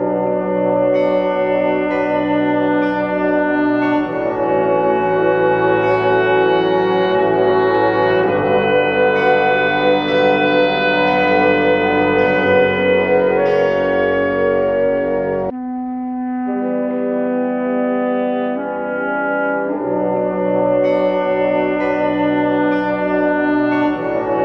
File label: horns, Sad